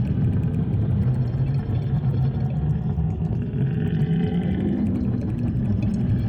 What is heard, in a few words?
cutholoids idle; Stellaris; grand archive; ships